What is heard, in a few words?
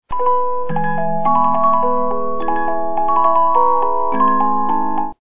Liebestraume, 50-Note Movements